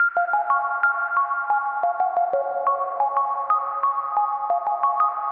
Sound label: Kawaii Future Bass synth bell